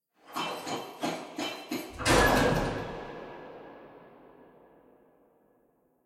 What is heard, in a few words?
curious